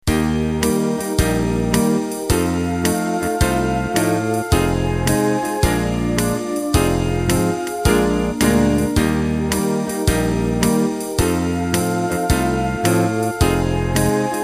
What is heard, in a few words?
Euro 2Beat; Euro 2Beat X & Euro 2Beat Y; Warm Synth Pad and Brushed Drums for Euro swing jazz 2 beats that are gentler than the Kaempfert styles